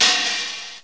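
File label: Ending open hi-hat; jazz jackrabbit 2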